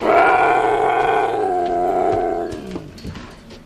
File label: Puma Growl Throaty, big cats